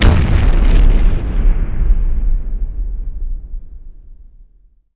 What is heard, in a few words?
boom; The Elder Scrolls III - Morrowind